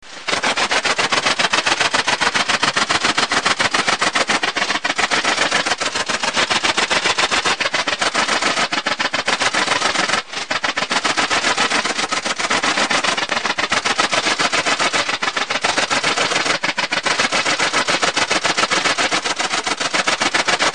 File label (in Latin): tettigonia viridissima; wirbellos; insekt; heuschrecken